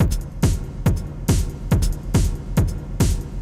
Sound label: techno 140